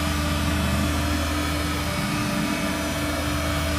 sentry gun pivot; coop; Titanfall 2

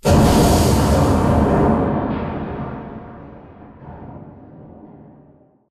Explosion Rumble Interior Close Texture; Boomtown; Titanfall 2

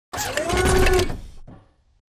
combat run to idle; Titanfall 2

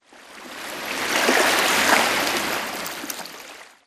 swampland water lapping; Titanfall 2

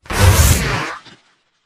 drone teleport out; coop; Titanfall 2

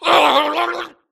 grunt electro; Titanfall 2

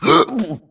grunt death neck; Titanfall 2

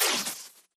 imp bullet generic titanshield; Titanfall 2

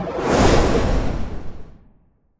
Explo FireBall; BatteryCrate; Titanfall 2

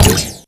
imp bullet lightballistic shieldwall; Titanfall 2

